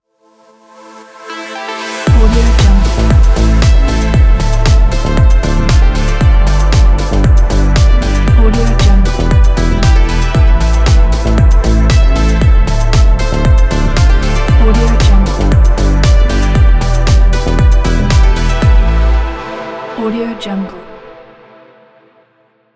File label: deep house logo